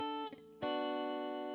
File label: GTR